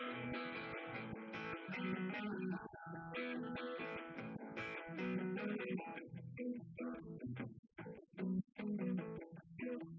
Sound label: wdrums liverec; SiSEC08; SiSEC underdetermined